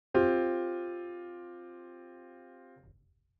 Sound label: C sus tone